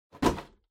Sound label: Drawing Box Put